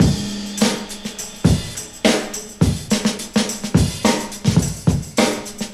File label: 94 bpm high quality rock breakbeat c sharp key, kick tuned to the C#